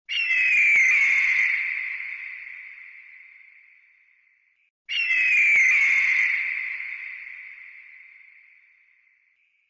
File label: Eagle Sound Effect